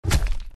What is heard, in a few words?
fall; Rob Runner